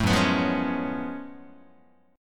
F sharp Minor Major 11th, Guitar; strummed; JGuitar

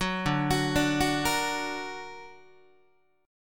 D Augmented D down Guitar; D+ arpeggiated; JGuitar

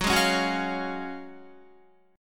Gb Major 7th Suspended 2nd, Guitar

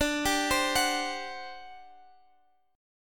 G Major 7th Suspended 4th, D

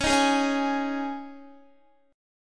Db Suspended 2nd, Guitar; strummed; 4E,4A,5D,5G,5C,6F; Dbsus2

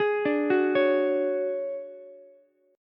D Major 7th Flat 5th D down Ukulele

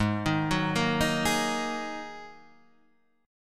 G sharp 7th Suspended 2nd G sharp

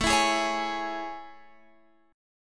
A Major 7th A, Guitar, Open F; AM7; strummed; x,16,16,15,16,15

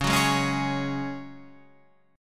Db Suspended 4th, Guitar, Standard; 9,9,6,6,9,9; strummed

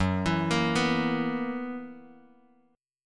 Gb 11th, Bass Standard; Gb11 arpeggiated; JGuitar

